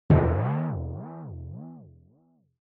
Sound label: Onoma Impact Dry